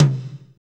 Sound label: tom, fast shuffle, Roland; Northstar; Drumscapes Roland; Toms